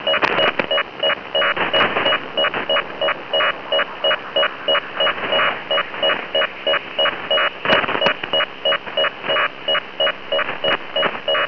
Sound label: Link11